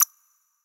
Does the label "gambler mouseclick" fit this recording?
Yes